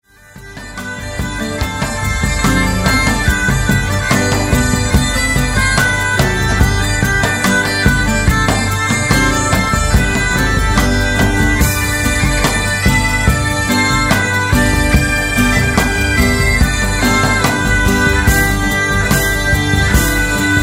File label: kerry polka